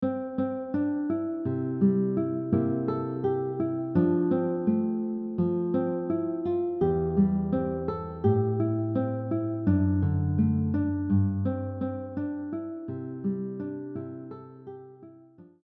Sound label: guitar; I Cannot Tell; hymns and gospel songs on guitar